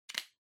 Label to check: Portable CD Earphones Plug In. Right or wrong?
Right